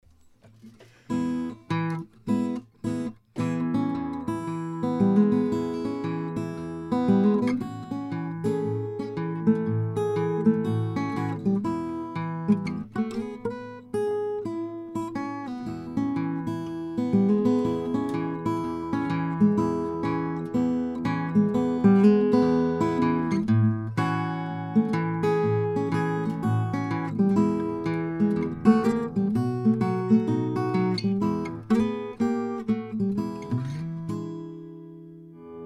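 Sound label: regal serenader 00, Guitars; 1935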